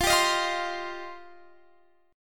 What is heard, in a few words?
F 7th Suspended 2nd F down